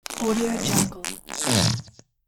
whoopee cushion

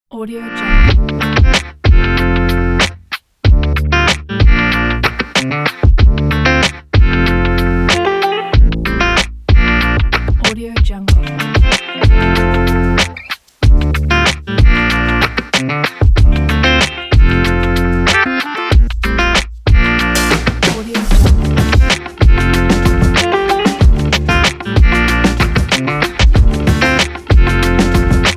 warm vlog

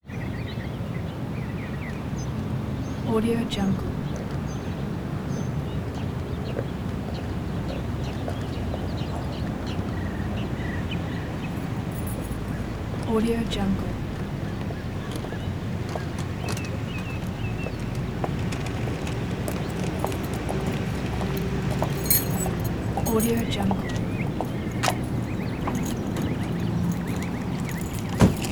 parking lot ambience footsteps birds singing

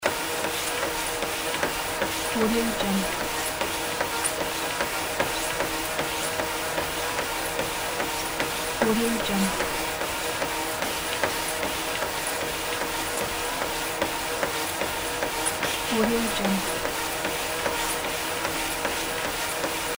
fast walking on treadmill loop

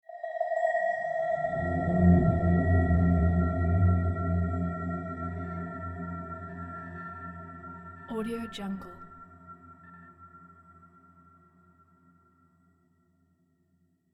yoga zen art sound

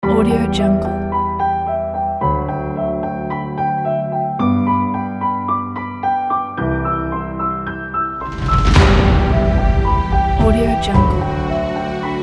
epic piano; bloodycrow

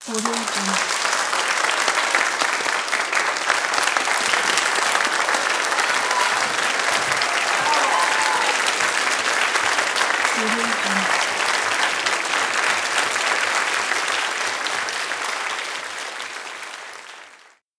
applause

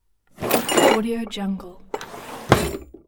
drawer containing metal objects

